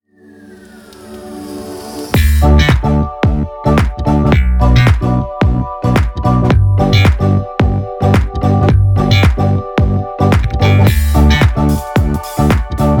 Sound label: (INSTRUMENTAL)